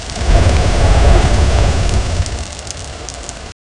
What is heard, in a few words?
fire, sound-effects